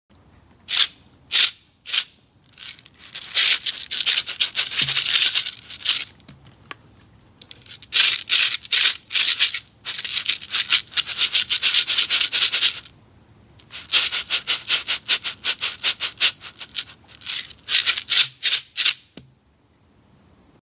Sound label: Shekere